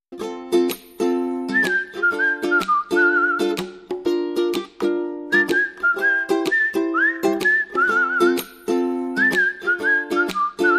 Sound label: Percussion Ukulele and Melody Only